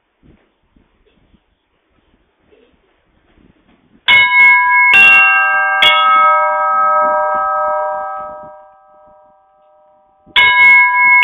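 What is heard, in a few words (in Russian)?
колокольный перезвон; zvuk kolokola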